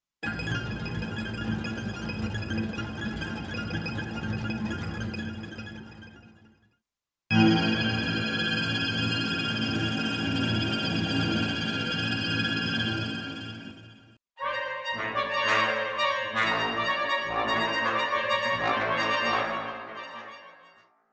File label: swell; NI arkhis; preparing for the duell layer einzeln